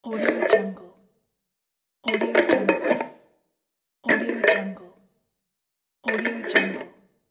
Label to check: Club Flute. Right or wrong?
Wrong